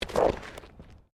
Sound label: Body movement Slide Road; Body movement foley to simulate falls and deaths; Bodies; Human